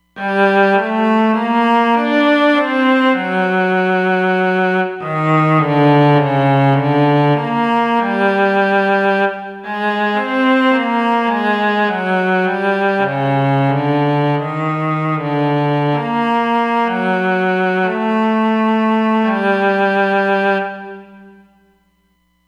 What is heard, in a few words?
Cello